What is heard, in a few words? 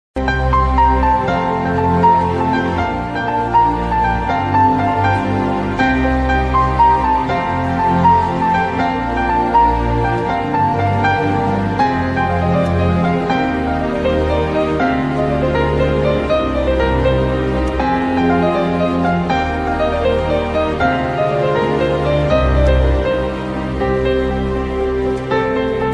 BGM tamil ringtone